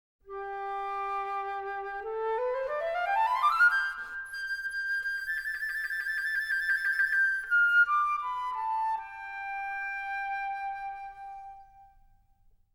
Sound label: Sleep Flute; Dragon Quest I; Symphonic Suite Dragon Quest